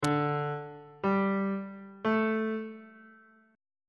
c double sharp suspended 4th triad chord intervals on bass clef